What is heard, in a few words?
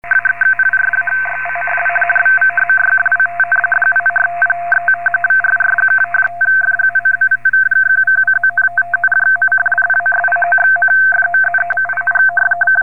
85 Mhz Fax; ADSP2